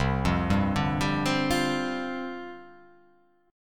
C Suspended 2nd Suspended 4th, down; Csus2sus4 arpeggiated

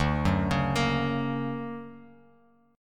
D Augmented Major 7th D down Bass Standard; D+M7 arpeggiated; JGuitar

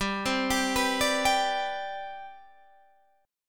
C Major 7th Suspended 2nd, G, chord

